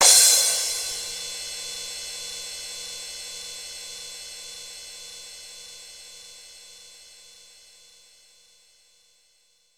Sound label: cymbal sound sample f key